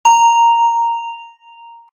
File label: elevator bell